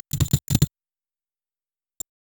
tiny sting, insects

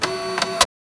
3d printer start

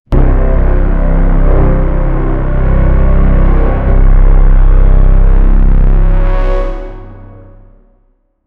industrial techno synth

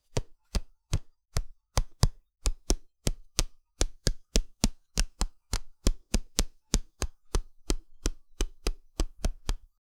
soft plastic pickup sound; mobile game

